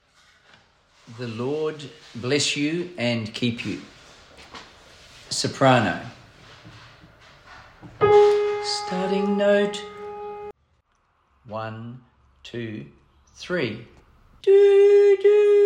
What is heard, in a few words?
Soprano The Lord Bless You And Keep You; choir parts; Hymns for Jubilation